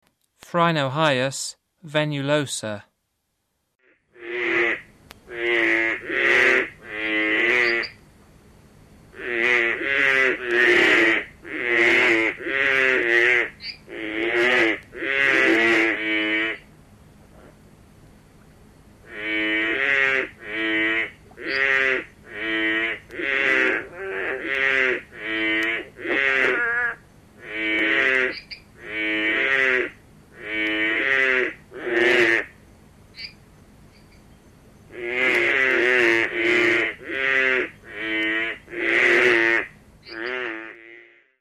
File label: Phrynohyas venulosa, Frogs of Ecuadorian Amazon; S. America